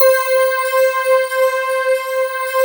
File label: C5, Synth; FANTA90S; Big Fish Audio; Synth City; PHANTASK